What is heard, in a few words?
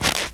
Step; wob2 demo